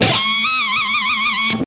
Guitar Wail

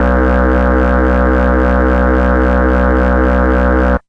inhaler, Synth

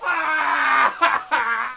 WAAHHH

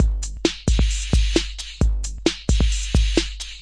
132 electro shushy snare, drum loops and breaks

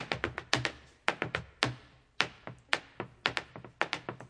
simple reverb desk tapping, 111 bpm

